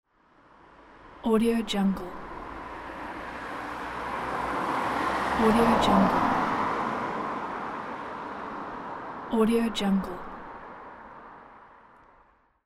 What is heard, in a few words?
car pass